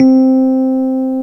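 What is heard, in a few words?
C3 RHODES PIANO; roland1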